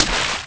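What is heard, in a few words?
splash; The Elder Scrolls III Morrowind GOTY; XBOX; FOOT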